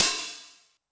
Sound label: open hat MOD Tune, 909; classic machines; 909mod; OH - OPEN HAT